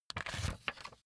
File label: magazine flip forward